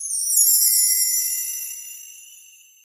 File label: a radiant ascending casca